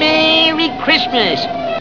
xmas Drum

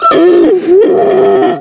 Stalker Fear

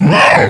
Clot Acquire

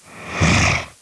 Scrake Fear

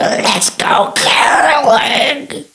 Siren Threaten; Carol

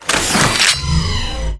Pickup; Food Fight Weapons; Papri

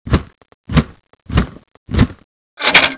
Toaster Reload

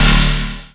Health Pack

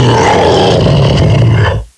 Pound Death

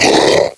Attack; KF Christmas; Patriarch

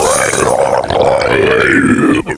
Deer Death

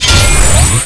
Shield Pack

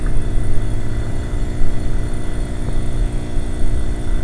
Hum

